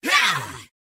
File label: attack; Mad Mage; Mob Voice